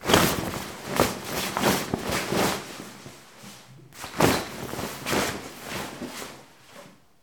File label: Textile Swishing